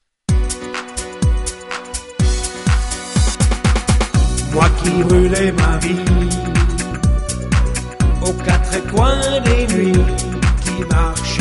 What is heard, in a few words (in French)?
musique